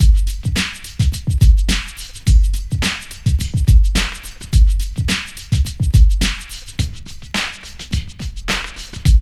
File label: PHAT LOOP; E-Lab; X Static Goldmine 2; akai